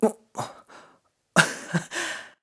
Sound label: Tickled; tickled by his Loftwing; Link; Skyward Sword; zelda